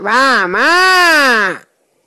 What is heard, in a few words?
Rap